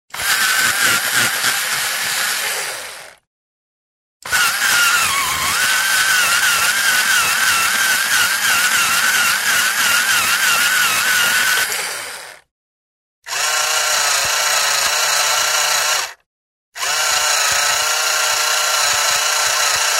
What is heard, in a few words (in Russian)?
elektrotochilka dlja karandashej